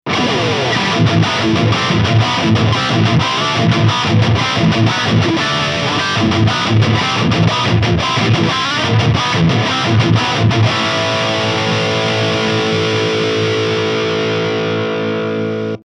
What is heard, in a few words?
High Gain; Solar Guitars A16D